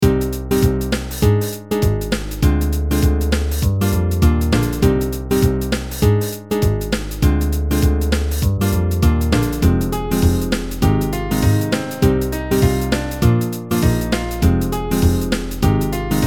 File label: C no Sharps; goody Melody Assistant Album